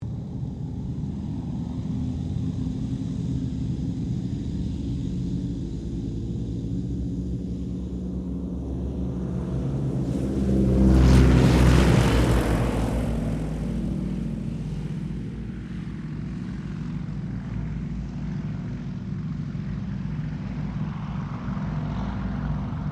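AIRCRAFT YAKOVLEV YAK-52 SQUADRON TAKEOFF, Russian Training aircraft; Airplanes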